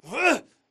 crawl diag grunt; Titanfall 2

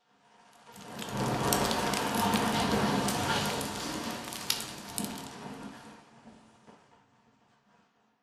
Explosion Rumble Interior Close Debris; Boomtown; Titanfall 2

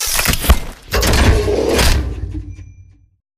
stand reload; Titanfall 2

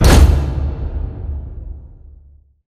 pod door close stop; Titanfall 2

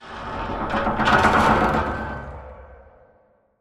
metal stress; Titanfall 2

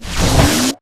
arc cannon raise; Titanfall 2

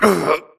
grunt hits; Titanfall 2

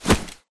Grunt Mvmt FOL Loud Short; Titanfall 2

